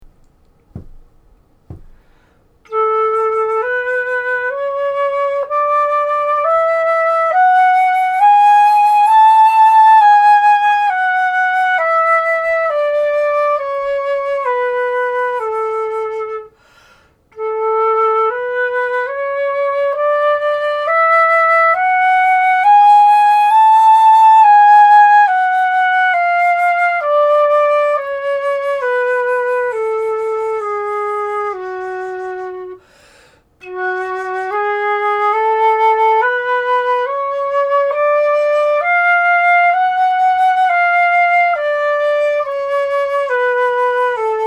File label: A major f minor D major, flute